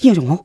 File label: Hm; Neighbours From Hell Console Edition; GC, Xbox